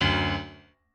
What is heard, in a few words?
piano